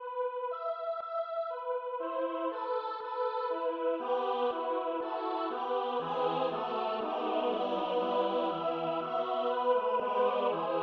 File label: tutti